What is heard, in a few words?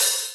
Hat Open